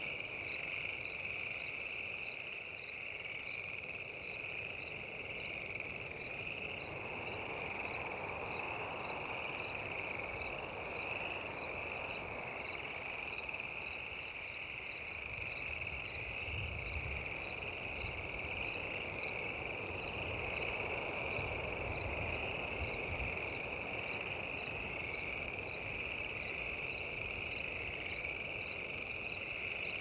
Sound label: Forest Loop; Dark Parables 02 The Exiled Prince